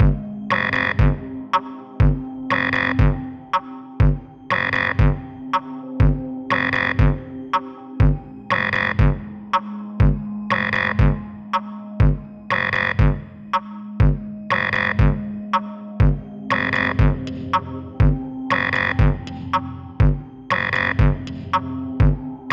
Creepy Lab Drone; sci-fi

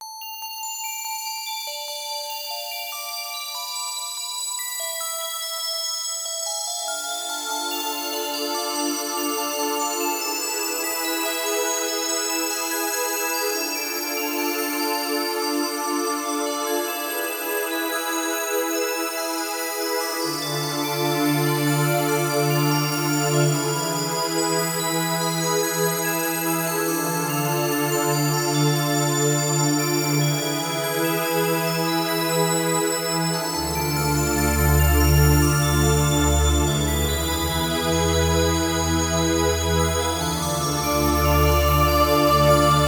A Sea of Jewels, fantasy

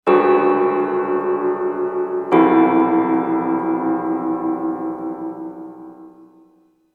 Creepy Piano

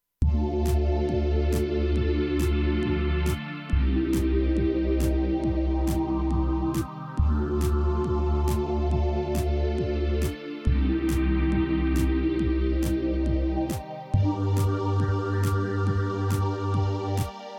Strange Game Menu

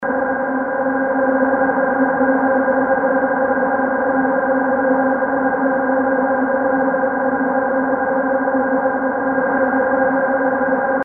Mech Drone

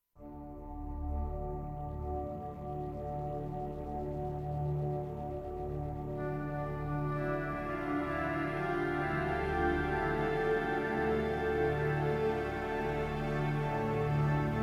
Dreamy Game Intro

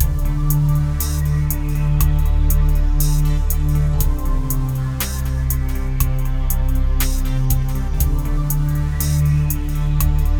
Techno Intrigue, fantasy

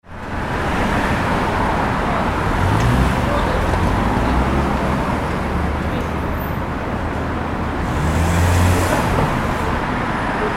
Street Corner

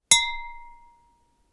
UI Quirky

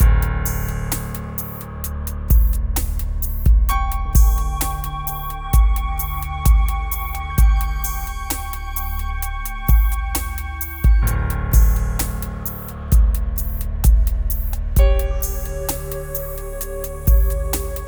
Closing In, horror surreal

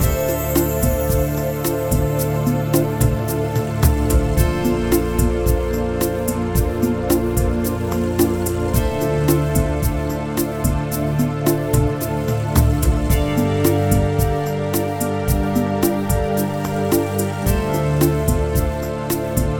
Dreamy Fantasy Game Menu

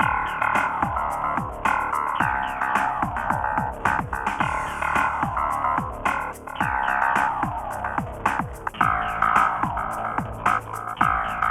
Grunge Street Game Lo Fi, action

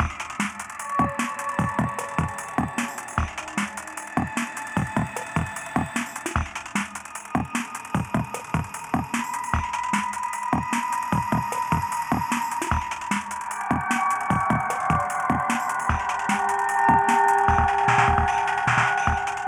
Creepy Action Lo Fi, horror surreal

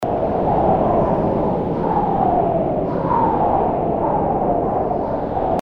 Alien Atmosphere Looping

